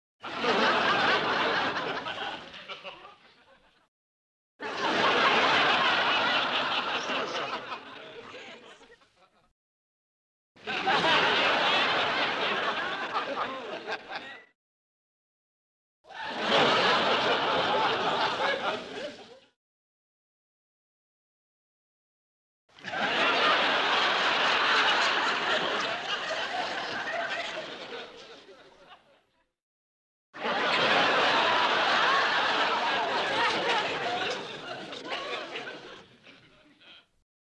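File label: Crowd 40 Medium Large Burst; small int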